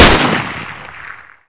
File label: mac10, weapons